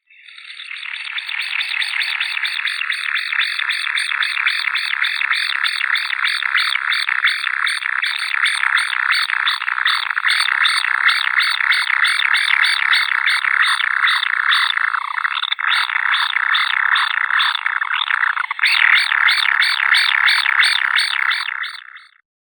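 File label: common kingfisher, Alcedo atthis, young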